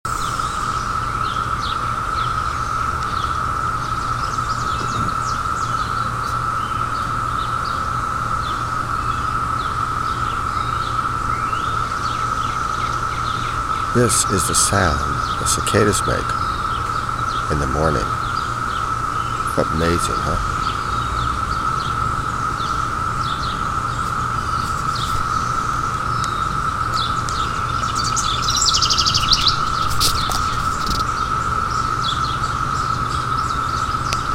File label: ufo sound; cicadas